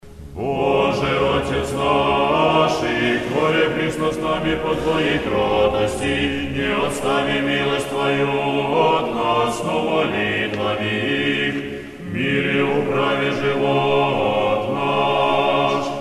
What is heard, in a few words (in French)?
Tropaire ton 4; Bozhe otets nashih; synodal; eglise catholique russe